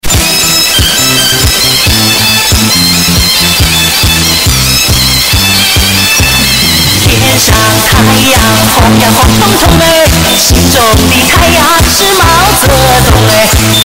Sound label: bass